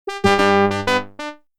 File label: Unused Jingle; Super Donkey Kong 99; unlicensed bootleg; Genesis